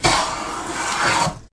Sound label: Hand Planing Wood; planers